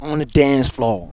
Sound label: VOX VOX; Sound & Vision - Gigapack II CD 2